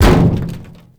Metal Debris Impact; Warframe; Tau

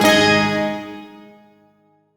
Shawzin Chromatic Chord; Warframe; Duviri

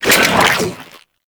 Sentient Sniper Reload; Warframe

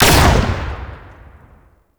Modular Secondary Barrel Fire, Weapons; SU Modular Guns; Barrels

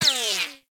Laser Whizzby; Warframe; Bullet Impacts; Whizzbys

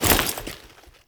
Foley Step; Warframe; Kahl

